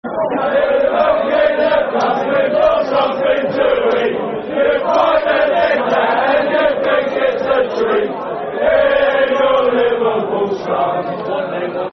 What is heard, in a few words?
In Your Liverpool Slums, Football Chants (Soccer Song); Chelsea Cfc